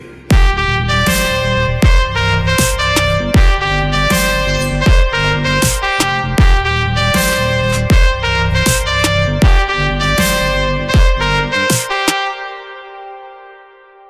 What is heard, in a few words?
Mass BGM Instrumental Music; Jawan